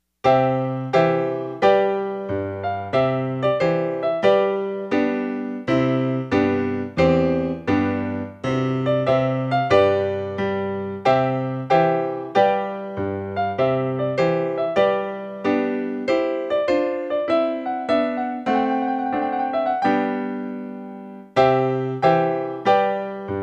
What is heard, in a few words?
Trumpet Tune, Wedding Piano; Clarke